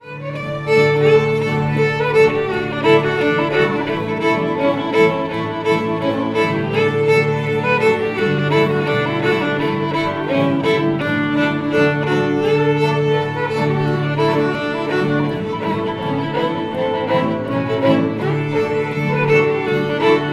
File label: Hornpipe; Marmadukes